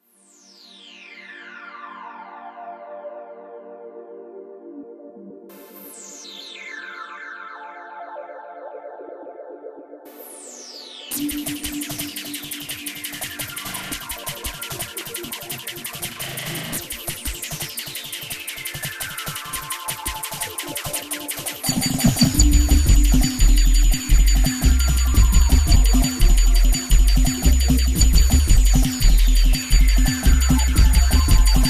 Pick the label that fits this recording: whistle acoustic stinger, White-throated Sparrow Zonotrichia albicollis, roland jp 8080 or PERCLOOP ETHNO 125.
roland jp 8080